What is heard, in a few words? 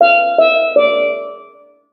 Drum Puzzle; escape academy; Escape From Anti-Escape Island